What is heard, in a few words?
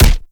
Fulmin Prime Alt Punch; Weapons